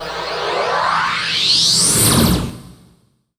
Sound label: Uplifter